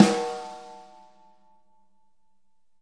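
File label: snare, Multisampled Real Kit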